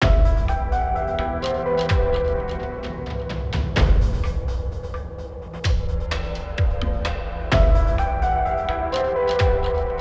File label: Ambient Arctic Pulse; Ambient Music Pack Vol. 6